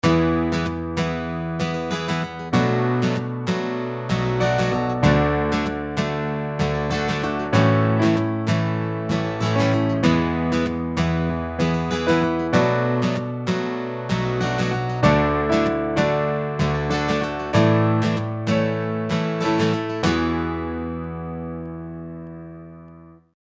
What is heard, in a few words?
Sustained chords Guitar Strumming